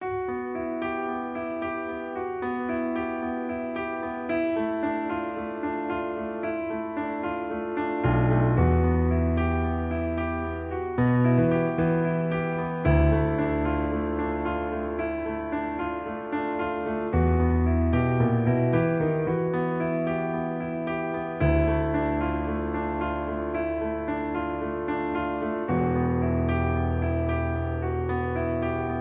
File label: Piano Cover